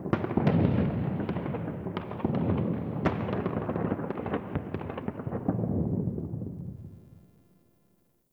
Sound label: Ambient Distant Explosions; Nineteen Ninety Nine